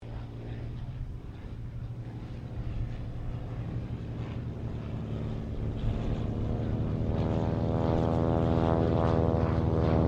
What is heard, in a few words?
AIRCRAFT BIRDDOG SQUADRON 3 PASS, Squadron of 3 Birddogs; Airplane; Airplanes; Vehicles